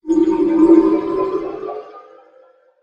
Pipe Filling

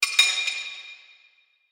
Fork Plate

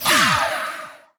Ball Through Portal

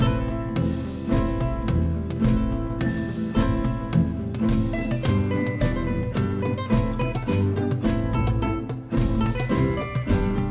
pleasant pirate bar; pirate mysteries android ios macos mobile windows gamerip 2012

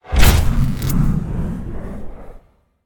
Electricity Power Up; Prison Architect

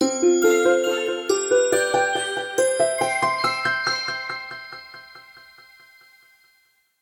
Wheel Of Fortune Golden Rain Short

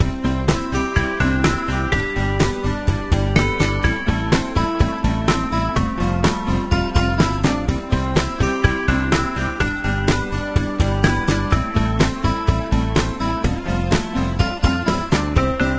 Menu Loop

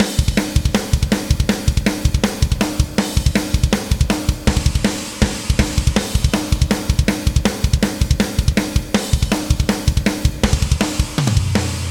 Battle DRUMS D

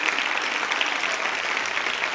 ambience, applause medium; birthday party bash; wii

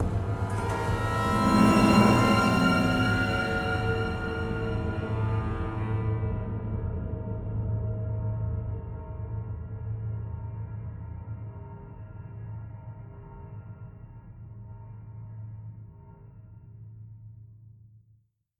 Hostages Music; Prison Architect

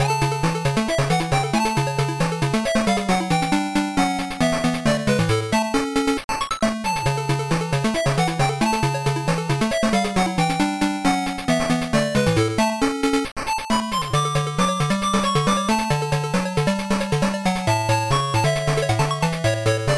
Baseball Menu; Mezase Pro Yakyuu Pico gamerip 1995

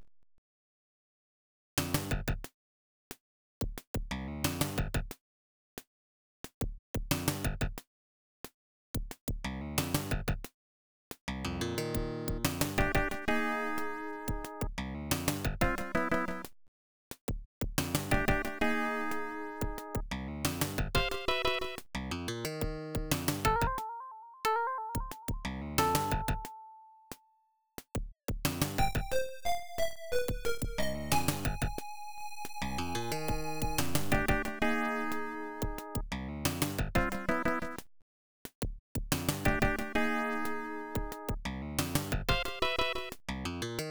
Ominous; Kurayami; PC-98

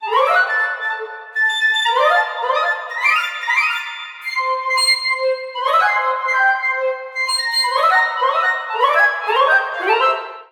Cave Hi Pic Fl; Torchlight III; PS4

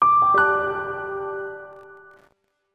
Reward Perle Court Mono

